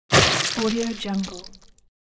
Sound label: gore smash slash